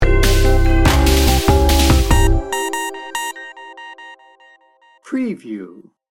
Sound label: sinister dark hip hop sting bumper